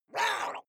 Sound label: water monster female gargle groan pain short; koerpergeraeusche; comedy-cartoon; soundpool